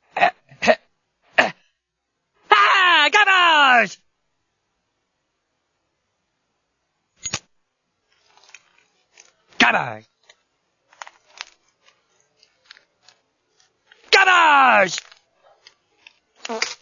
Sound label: gobbles